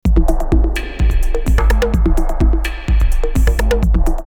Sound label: Rave drumloops 127; X-Static Goldmine; 90 s Sample CDs